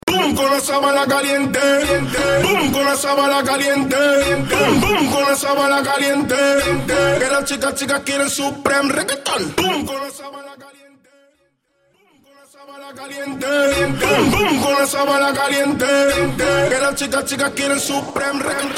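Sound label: Reggaeton Mainstream Acapella In 97 Bpm; Mainstream VIP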